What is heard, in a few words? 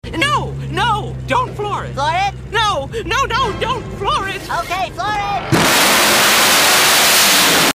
loud car vroom